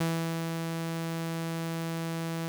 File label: Saw Wave